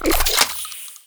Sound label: Reload Scope Gun, Weapons; Warframe; Corpus